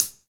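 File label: hi hat; HH Kits; Stereo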